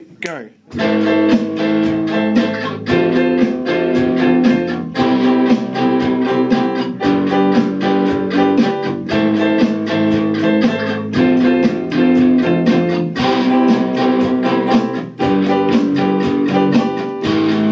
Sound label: chords rhythm; year 9